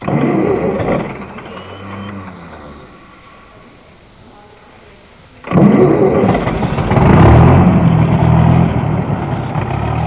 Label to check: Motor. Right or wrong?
Right